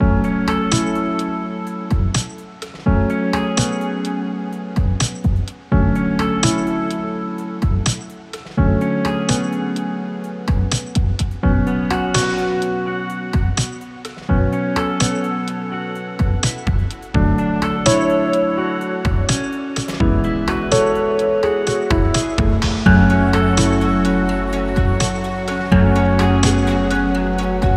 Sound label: menu, music